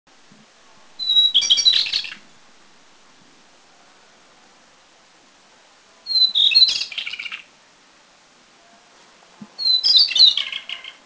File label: White-browed Shortwing, Taiwan